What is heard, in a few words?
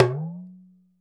Talking Drum up; Drums&Percussion; E-MU Producer Series Vol. 7 – Old World Instruments